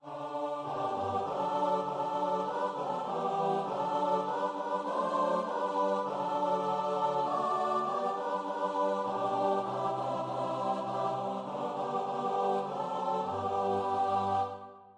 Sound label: thousand tongues, choir